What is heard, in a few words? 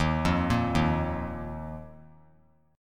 D sharp Suspended 2nd; arpeggiated; JGuitar